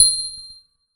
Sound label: Glittering Clink